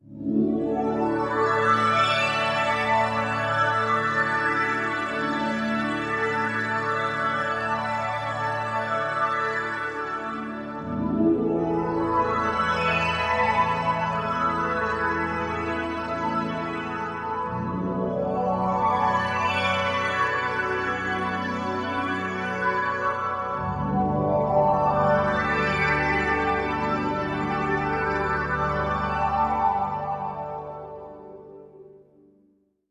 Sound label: Hopeful and uplifting drone